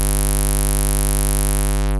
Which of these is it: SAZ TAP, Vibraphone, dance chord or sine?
sine